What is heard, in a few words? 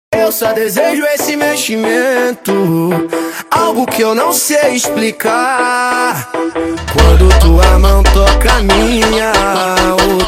FUNK